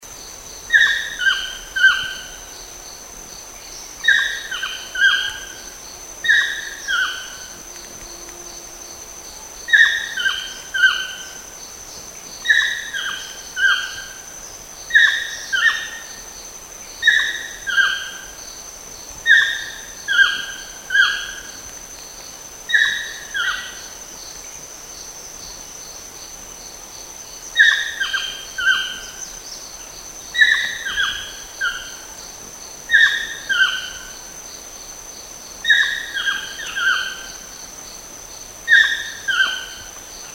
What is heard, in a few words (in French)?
toucan tocard, chant